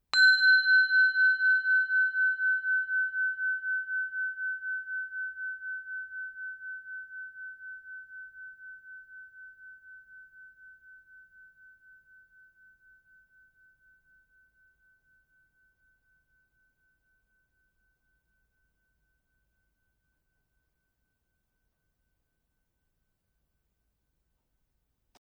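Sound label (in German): Glocke klein, bienenkorbglocke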